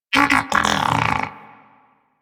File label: Disagree, Moa Pet Tenacious; Warframe; Pets